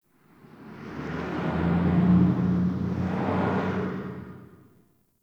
Distant Traffic K; Warframe; Ambience; NineteenNinetyNine

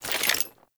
Generic Foley; Warframe; Dagath

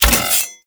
Micro Spin Attack Hit; Warframe; Corpus; Arachnoid Micro; Abilities

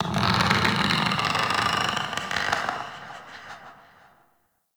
Forest Distant Tree Creak; Warframe; Grineer

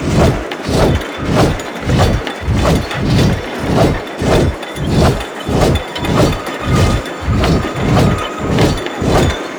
Forest Cave Turbine Loop; Props; Warframe; Grineer Forest

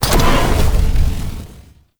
Void Dragon Firebreath Mech; Warframe; Duviri; Weapons

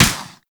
Pursuit Space Ship Turret Fire; Warframe; Grineer; Turret B

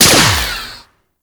Mech; Warframe; Weapons; Strun; Strun Prime

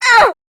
Pain, Grineer Queens Guards; Battle Barks; Warframe

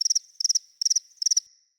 Prairie Day Close Cricket

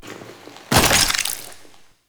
Pickup Scanner Ground; Entrati; Swarm

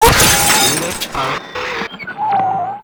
Screen Blast; Ambience; Props; Warframe; Nineteen Ninety Nine

